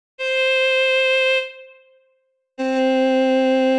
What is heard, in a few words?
C6 C5 violin